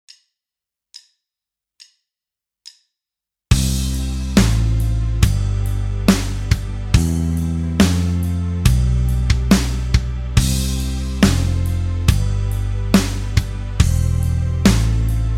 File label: backing; In The Woodshed; Guitar Techniques 2017 Issue 274 October